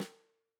Tam Snr, drum

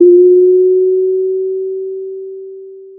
pop, Sound of a doorbell

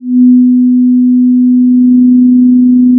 pop, Sound of a cruise ship horn

